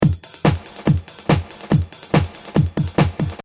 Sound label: Techno Trance Drum Loop 142 Bpm; Royalty Free Drum Loops